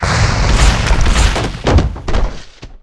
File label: die; 패왕비